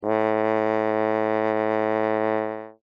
French Horn ff A2

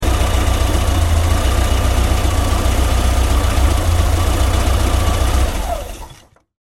Engine Stop

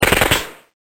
UZI

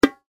Bongo Macho; Stereo

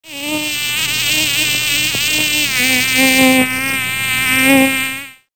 wasp

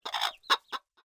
ostrich

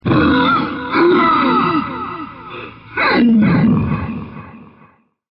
monster

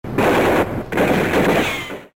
Machine Guns

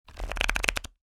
shuffle cards

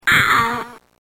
warn

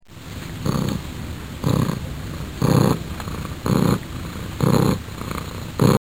purr

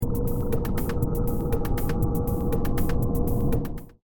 background

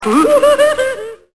laughs

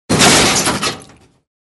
Metal Crash; Stereo

